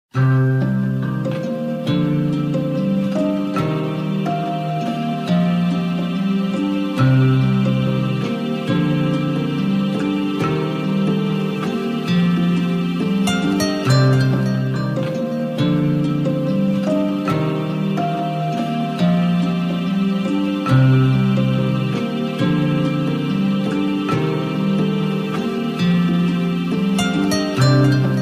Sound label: Relaxing Spa - Little Waves